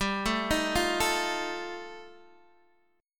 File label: G 7th Suspended 2nd Sharp 5th G chord, Guitar Open D